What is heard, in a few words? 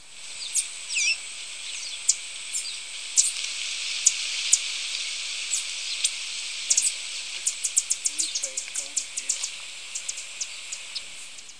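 golden-masked tanager, Tangara larvata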